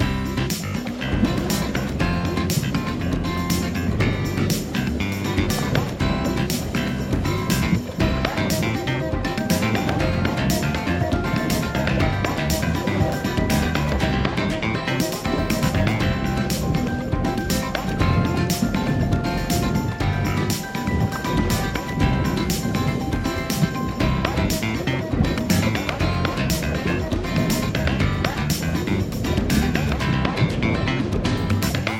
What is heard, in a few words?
Background; Dolph Dive